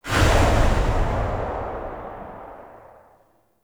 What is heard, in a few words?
Pod Shotgun Tail; Warframe; Weapons; Tenno